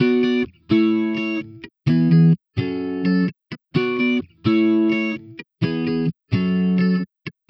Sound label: Electric Pop Guitar 128BPM Bmin; Electric Pop Guitars; Vengeance - Essential Clubsounds Vol.4; Guitarloops